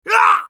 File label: scav wound; eft